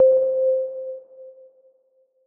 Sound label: One Shot - C, Synth; Cymatics; Light Free Sample Pack; Bonus Samples